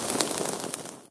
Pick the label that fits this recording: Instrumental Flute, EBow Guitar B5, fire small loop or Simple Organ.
fire small loop